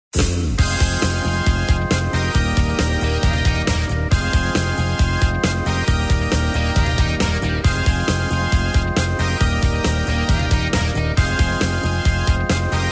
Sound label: Rock Oldies Medley; bonmidi music; xg Demo